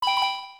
correct

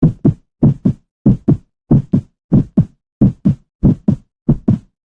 heartbeat moderato; yttd

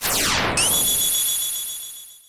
scream intro; pmd2

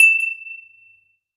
magatama bling

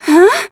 huh; mukuro

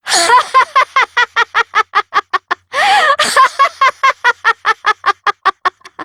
laugh; sparkling justice

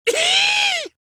heee; monokid

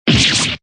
surprised; juniper

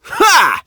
grunt; sonic; vc roger

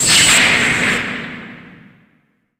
thought route flash

